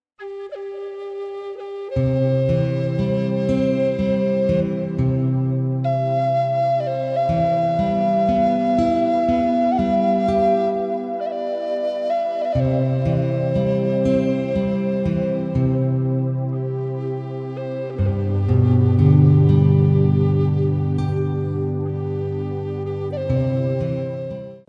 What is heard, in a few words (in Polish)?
muzyka bez oplat